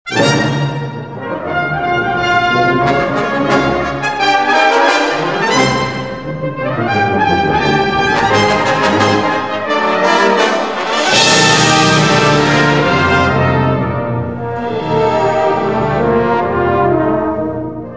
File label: band, musical instruments